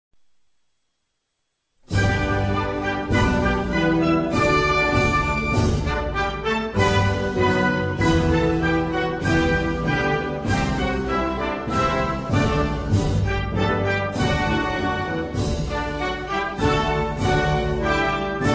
Himno nacional de Singapur - National anthem of Singapore